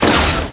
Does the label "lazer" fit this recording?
Yes